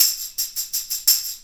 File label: Tambourine; Sonic Foundry (Sony Creative Software) - Essential Percussion